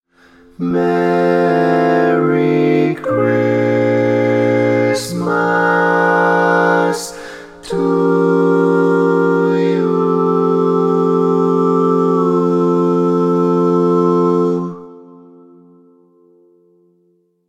Merry Christmas To You, All Parts, barbershop tags